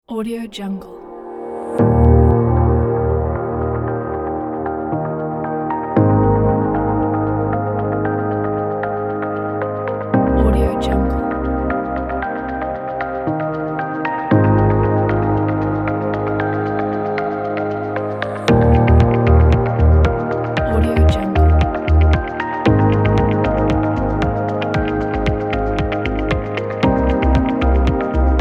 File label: minimal technology inspiring corporate